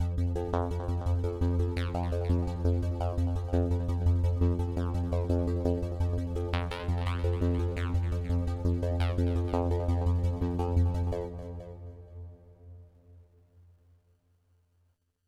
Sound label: Overlay Tone; Flytrap